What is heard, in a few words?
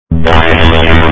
teleport; demolition 2